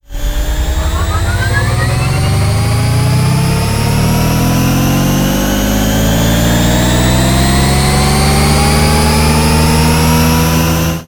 lightbike accelerate; sonniss gdc

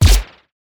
Laser Fire

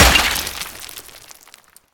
rupture marble impacts; sonniss gdc

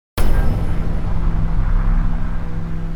Retort Loop, weapon fx; lancer weapon fx

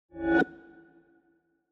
melee attack swoosh magical effect psychic; weapon swooshes; psfx

